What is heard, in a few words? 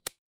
ui toggle